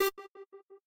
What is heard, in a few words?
synth